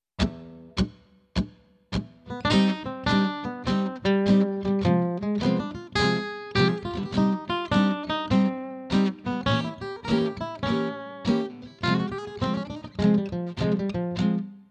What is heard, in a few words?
Gypsy Blues